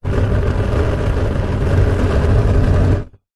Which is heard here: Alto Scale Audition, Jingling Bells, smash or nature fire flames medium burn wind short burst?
nature fire flames medium burn wind short burst